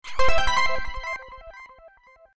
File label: Reel Spin Stop Special; Cash Honey